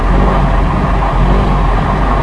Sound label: air turret fly loop, vehicles; hl2